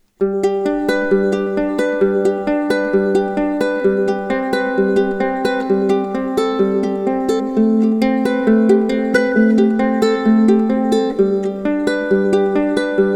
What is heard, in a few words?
Ukulele Picking